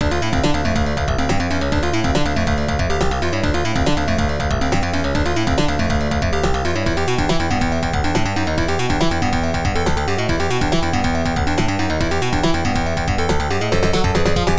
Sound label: Tension; Visitte; PC-98